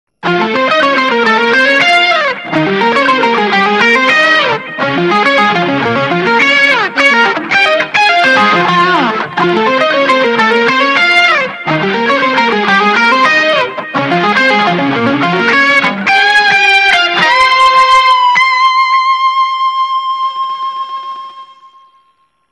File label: Solo Ringtone